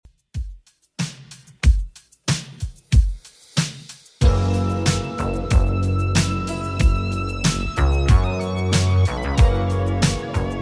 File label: 80s Redrum 93bpm